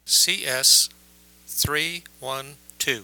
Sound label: Change Speed